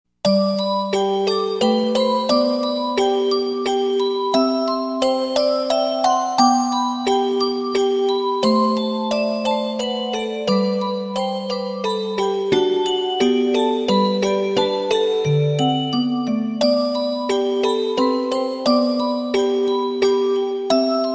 Cute Song Sms

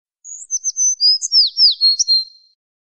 Birds Sms

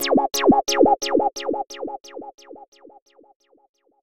Lfo Wobble Tone

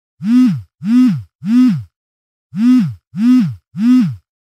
Vibration mode

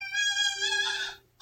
Pug scream

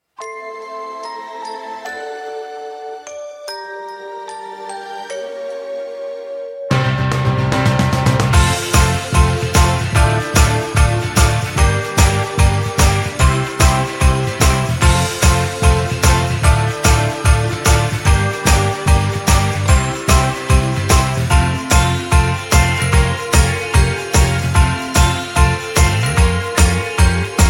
Christmas Tune; meloboom

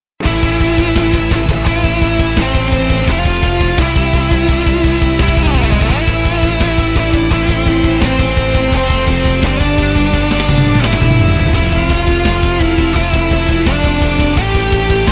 Metal; Armageddon

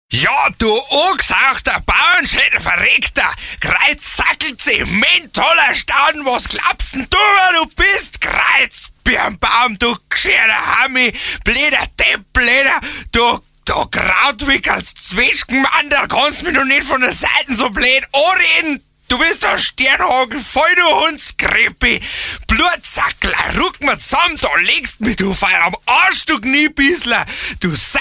Nice Bavarian

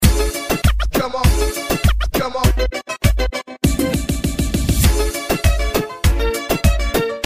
Reggaeton

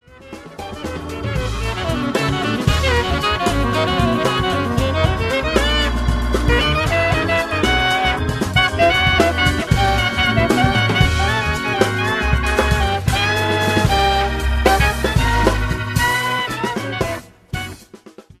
Jazz

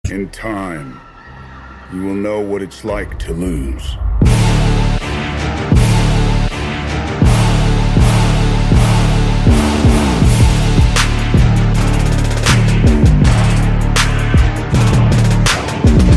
trap remix; Thanos

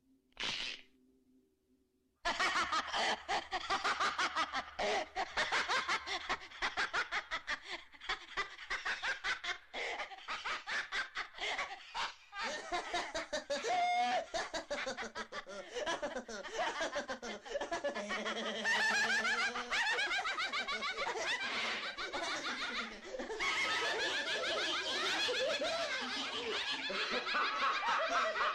Evil laugh; Evil dead

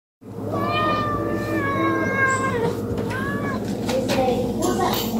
Meow; Ginger

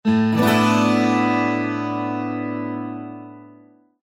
Windows guitar on

